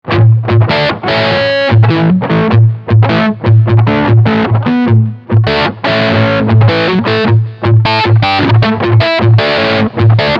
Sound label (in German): Riff Mittlerer SC